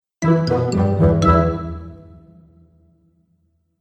Cartoon Fun Musical Element

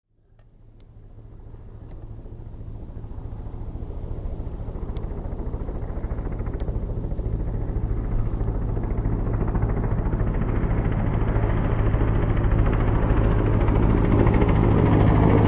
Helicopter over low pass; Helicopter Sound Effects

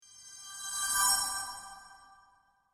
Fairy Sparkle Whoosh

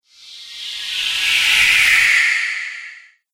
Reverse Cymbal Whoosh

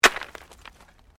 Digging Pick Stones

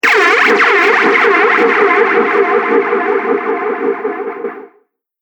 Huge Laser Echo Blast; Sci-Fi Sound Effect

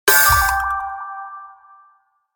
Hit Musical Accent String Pluck; Production Element Imaging Element Accent Transition

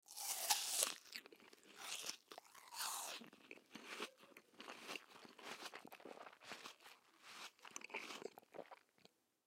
Human Bite Food; Bite Food Chew Apple Eat; Eating; Sound Effects:Human

